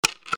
door lock

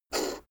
poker chip flick slide table

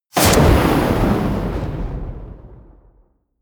missile large fire helicopter close

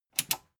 metal button tiny click enable fast

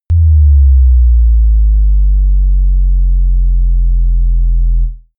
bass drop soft dirty

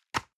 envelope drop medium

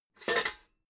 pots clank roomy

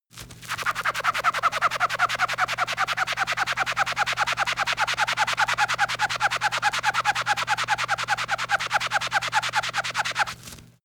sponge squeak fast

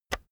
keyboard external enter push heavy

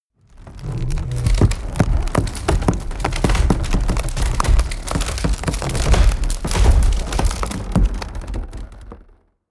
wood medium pressured

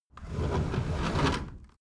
window roller shutter close soft single pull

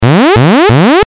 warning signal

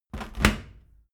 washing machine tumble drier door close

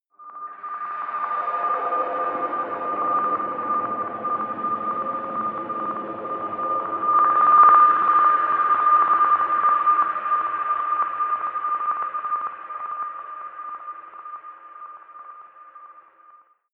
space ringing

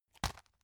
tissue package drop

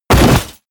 bodydrop armor

